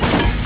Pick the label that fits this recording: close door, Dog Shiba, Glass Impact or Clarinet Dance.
close door